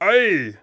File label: clown hit